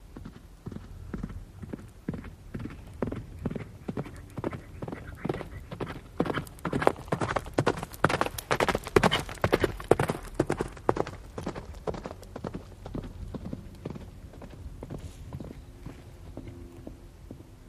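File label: Horse Gallop Left To Right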